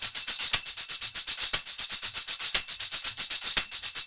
CLAV TAMB; USB Soundscan vol.32; Roots Disco; AKAI

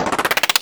GUN ROLL; Spectrasonic Distorted Reality 1; BIZZAROS

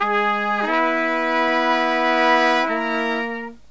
Killer Brass Riffs; USB Soundscan vol.29; AKAI

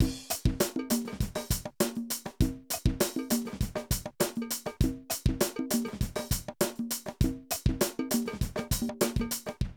Drum loops, 70s funk; Zero-G Groove Construction (1993)

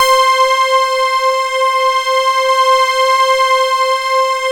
C5 prophet pad; Sound Gallery - Charged

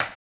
HAMBONE, Vocal Blues Soul; Spectrasonics; Planet

